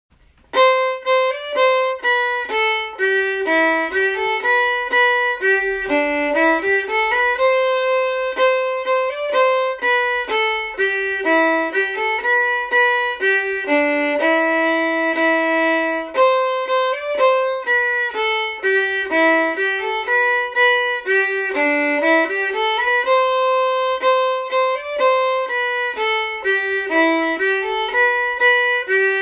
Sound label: an dro in am harmony, fiddle